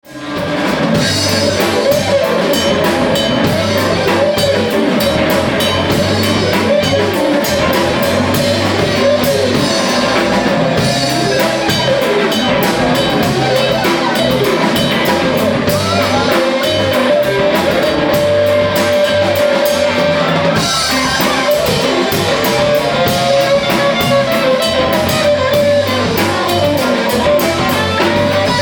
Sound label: arpeggios funky jam